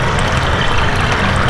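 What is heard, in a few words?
water pipes; ambience; XDM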